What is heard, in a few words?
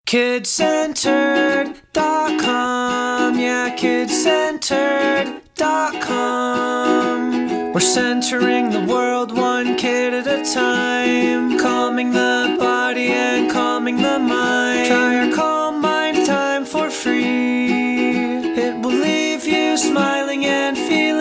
jingle; kid centered